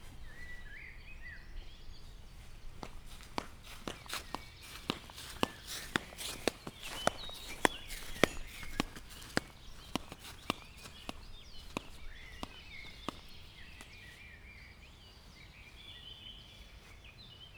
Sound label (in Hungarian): gyorsabban vizhaz, papucs; cardioid